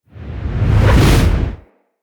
Fall Whoosh; Foley; Characters; Tenno

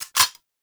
Double Barrel Shotgun Mech; Warframe; Weapons; Tenno

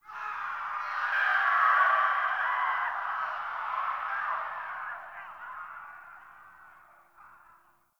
Distant Ambient Battle Cries; Warframe; Tau

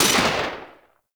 Artillery Mech; Warframe; Nechro Mech; Abilities

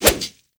Wolf Swipe; Animals; Duviri

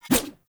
Large Dagger Whoosh; Enemy; Daggers; Snc Large Dagger

